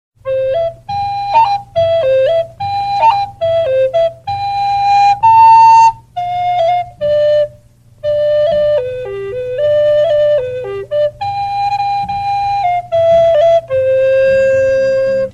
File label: blow the man down ringtone; blow the man down shanty ringtone